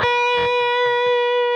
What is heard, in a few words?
PRS C 5, Guitars, PRS Lead; Spectrasonics; Hans Zimmer Guitars